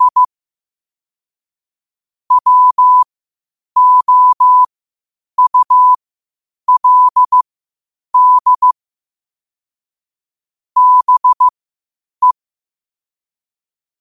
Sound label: 5 wpm, morse